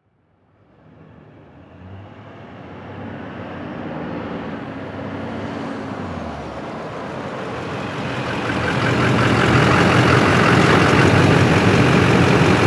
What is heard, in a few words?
Diesel Truck Up Shut Off; transportation